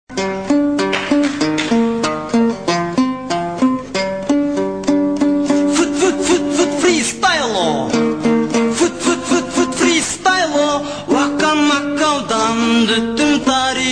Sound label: Freestylo